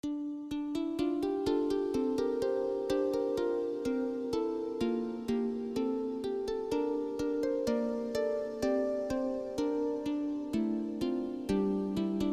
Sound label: harp